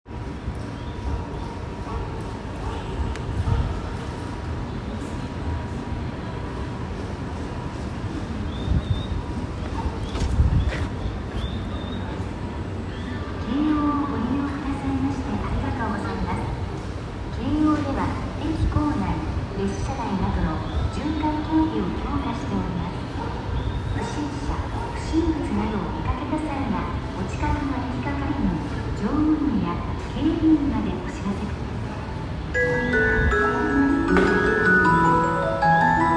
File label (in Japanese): 京王線府中西接近